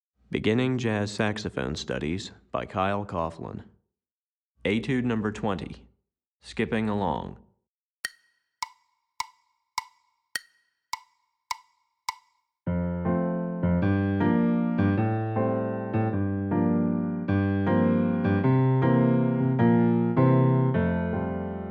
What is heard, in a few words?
Piano Part, Beginning Jazz Saxophone Studies Alto